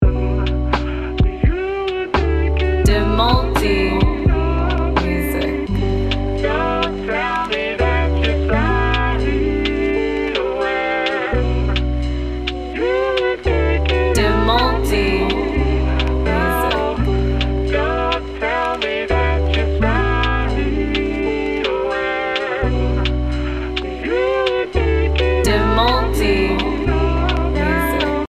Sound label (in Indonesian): lofi, beat drum lofi yang lembut, dipadu hum vocal dan harmony voc